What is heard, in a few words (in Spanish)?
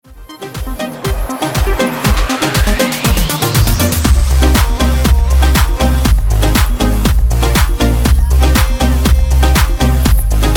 Reggaeton